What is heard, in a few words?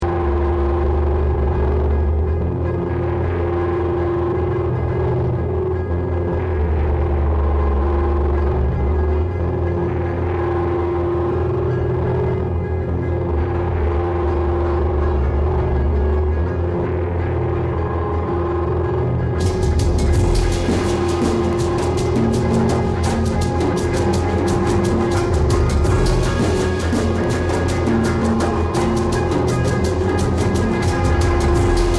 Bass Drone